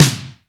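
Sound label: GOGO snare, GO GO DRUMS; Crypton - DrumBase5000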